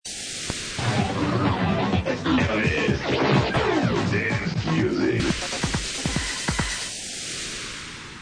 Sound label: dance music